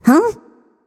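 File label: nonverbal confused; smite; voicepack; izanami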